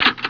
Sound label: bang